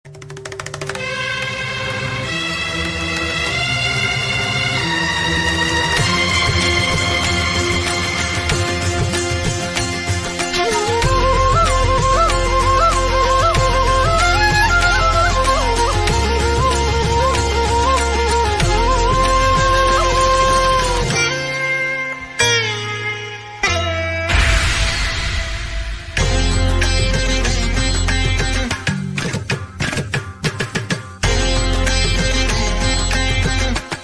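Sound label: horror background music ringtone; chandramukhi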